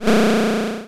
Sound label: Cry